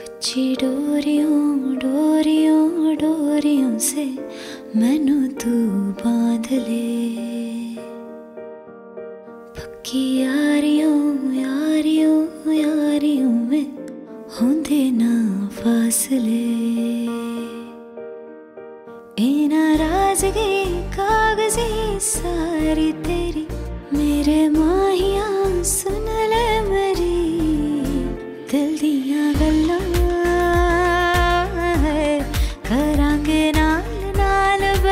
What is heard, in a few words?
Female Ringtone; ringtones; Tiger Zinda Hai